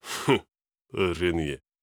laugh

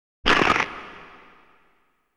Biting sound; 1 area boss

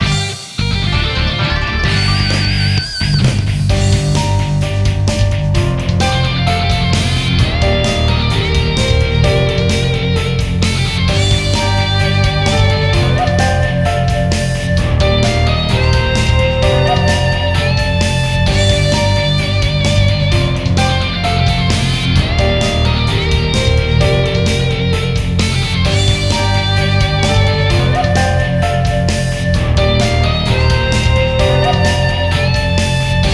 Battle Victory; Naruto Shippuden: Ultimate Ninja Storm

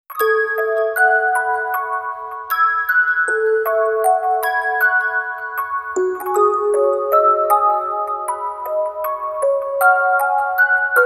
Orgel; yukikoi melt

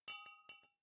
Bottle Shake; Devour; The Town

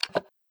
Button Tab Click; this is the president 2021 gamerip

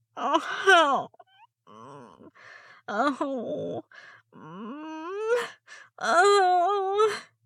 moan

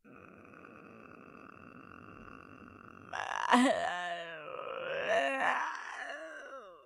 groan

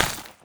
land stone

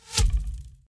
Ranger Hail Of Arrows Impact; Idle Champions of the Forgotten Realms

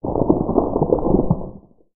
Rumble

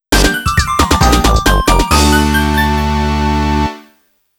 Kaishi Fanfaare; psikyo arcade sound digital collection vol. 08; Supebon GO

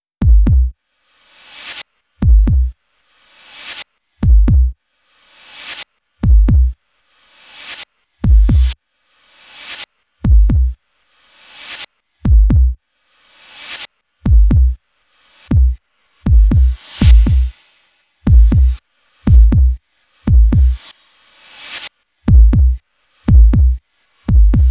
Shock

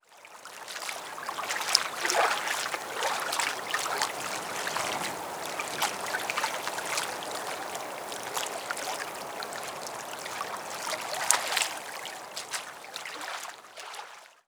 environment water quiet docks

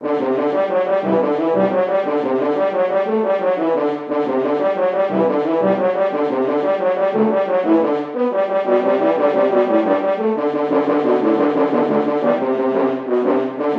Horn; The Bard's Tale Remastered Trilogy

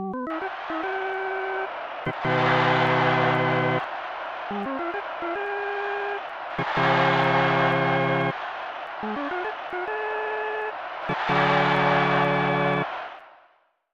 Stadium Organ; snes; Brett Hull Hockey

